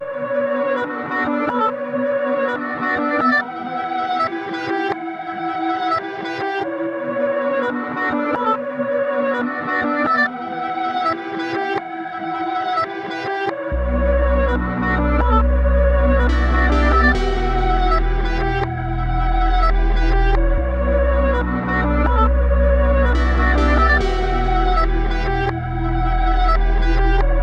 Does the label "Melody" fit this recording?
Yes